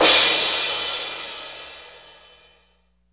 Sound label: Alesis Crash